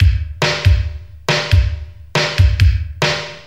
139 bpm 80s drum beat D key, Free breakbeat - kick tuned to the D note. Loudest frequency: 1096Hz